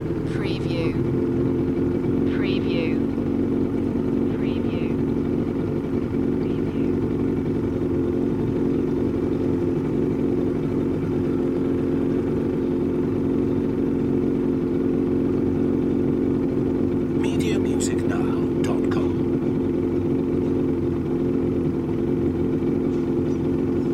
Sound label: idle motor boat engine